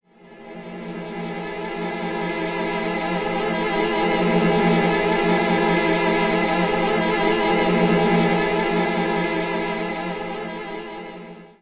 STRINGACCENT, Orchestral Loops

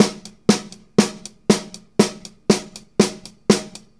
Live Beats, R&B Drums

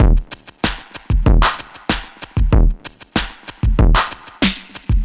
Electro Drums 95; musicradar

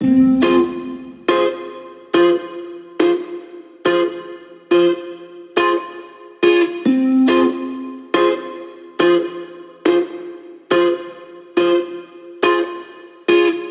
140BPM Fmin Guitar FX, Offbeat Dub Guitars; Antidote Advent

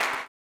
CLAP, blues & soul; ILIO; Vocal Planet

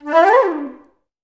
FL FX, Orchestra; Best Service

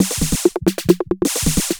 Percussion, Trance NRG, Drums; Sonic Foundry (Sony Creative Software); Trance NRG VOL-2

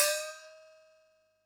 GONG, Yamaha DD-65

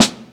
Brown Snare, Snare One-Shots; KJ Sawka; Drum n Bass & Beyond; Drum Tool Box

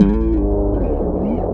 Bass FX

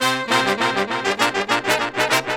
Horn Sect Effect

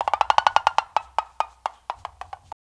Wood Percussion, World Groove; Ambient Grooves

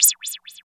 MOD CYM; East West; Phat & Phunky

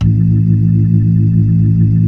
B-3

